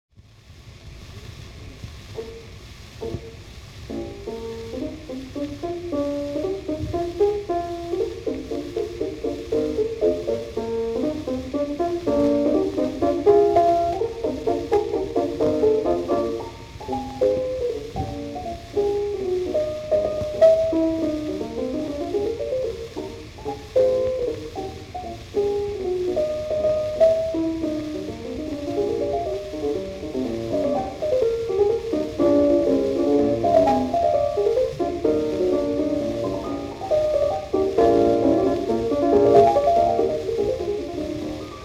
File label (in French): Valse Mignonne in E-Flat Major